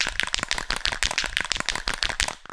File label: spray can rattle